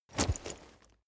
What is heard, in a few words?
leather metal impact soft